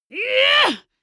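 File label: female combat attack short, screams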